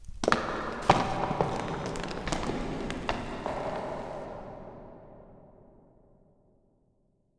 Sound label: Rocks; Inquisitor 3